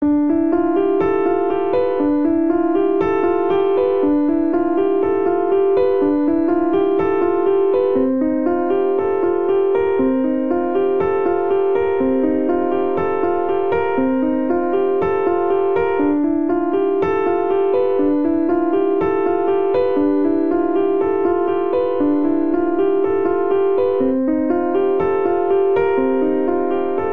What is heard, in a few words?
Ostinato Cinematic Arpeggio D Minor - C Minor 60 bpm, Grand Piano; MIDI Clips; Ableton